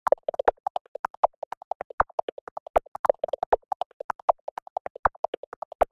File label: Dr Loop percus